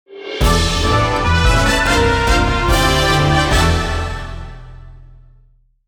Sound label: Double Up Win